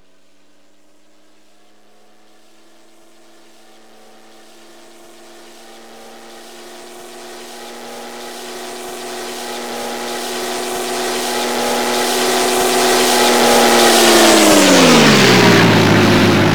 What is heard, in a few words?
DB605 FLYBY; engine sounds